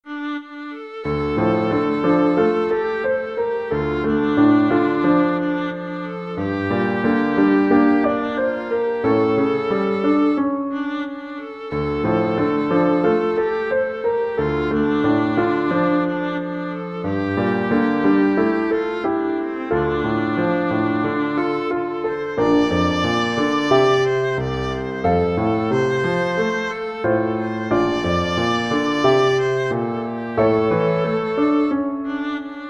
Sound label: wayfaring stranger, viola